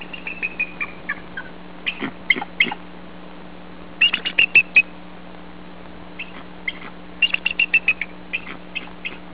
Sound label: bald eagle sound